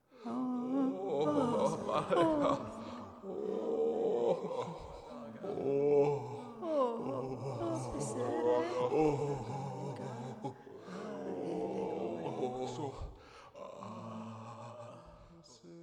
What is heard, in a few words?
pilgrim crowd